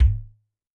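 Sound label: bass drum udu, Kick, Yamaha DTXpress-II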